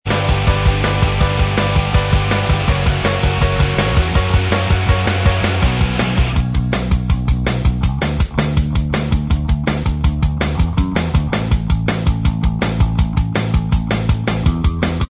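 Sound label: instrumental track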